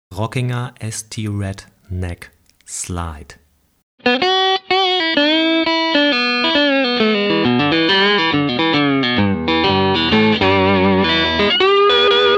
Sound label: Slide; Rockinger ST-RAT Neck und Neck/Bridge